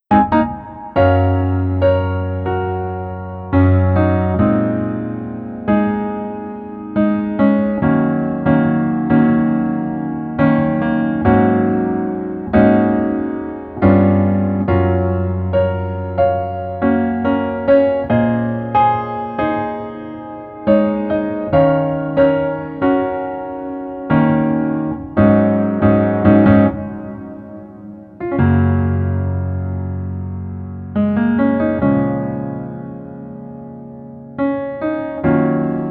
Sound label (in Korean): Piano ver -1키